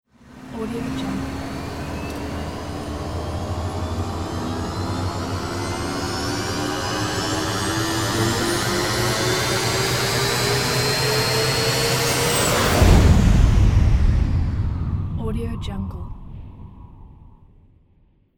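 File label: spaceship takeoff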